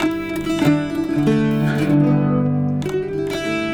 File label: Bouz Down, Acoustic String; Sonic Foundry (Sony Creative Software); Friendly Fire